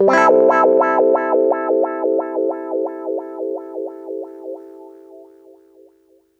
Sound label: 089 WAH PAD, Electric Guitar Loops; USB Soundscan vol.04 - Electric & Acoustic Guitar Loops; AKAI